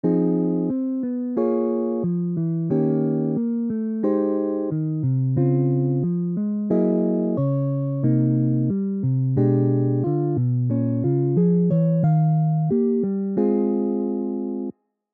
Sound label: solo guitar